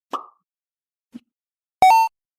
energy recharge; Sci-Fi

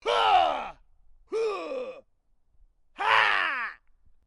karate screams; Horror

